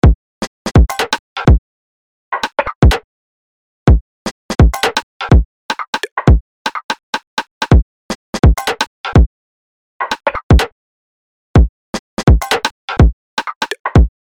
insides drums; random and out of place

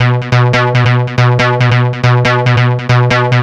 NRG Lead, trance; Leads; Sonic Foundry (Sony Creative Software); Trance NRG VOL-1

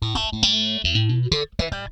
FAZE PLUK, Funk Guitar; 90 s Sample CDs; Zero G

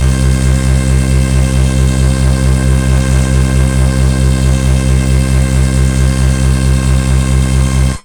Venus, Basses; AMG - Prototype Drum & Bass

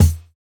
Kick, jungle breaks drum sounds

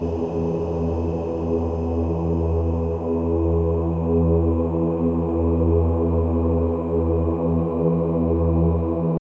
ohhhhhhhhhhhhhh, vocal

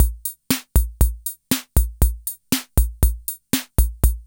ROCK; INIS - Funky Xtreams; KR55 LP4

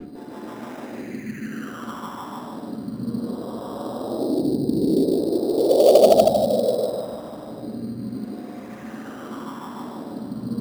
GRIT BELLS

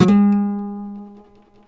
A, Martin, guitars; Spectrasonics; Hans Zimmer Guitars VOL-1; MARTIN HAMMR

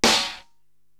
SD Hip Trip, Korg TR-Rack drum machine

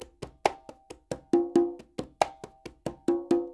NYC Percussionworks loops; Sampleheads

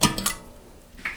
Finger, Prepared Kit; Sonic Foundry (Sony Creative Software); The Electronic Music Manuscript Collection; Battery 3 Sample Kits

